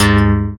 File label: Guitar Electric Bass Failstate; Instruments